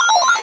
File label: Retro FX; Cymatics; Video Game Sample Pack Vol 1